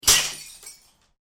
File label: Bottle Smash Heavy; Smashes; Objects